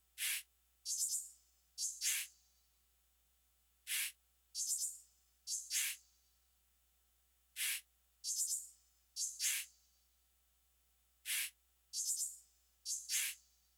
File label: Perc